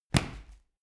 medicine ball catch, fitnessstudio

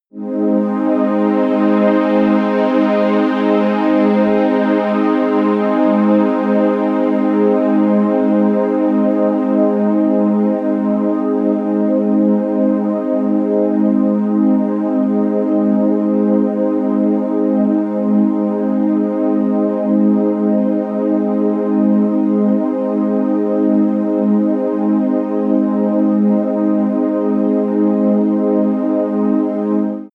sweep air, music elements

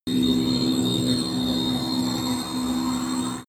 magic warp relocate building damaged

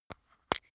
makeup box open, badezimmer; haus-garten

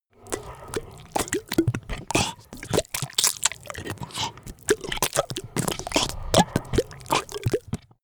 monster flesh eating; comedy-cartoon; soundpool

